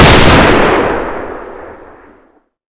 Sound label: halogun